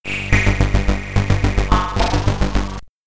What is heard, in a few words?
SID Stereo, Commodore 64